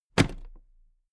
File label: toolbox place upright heavy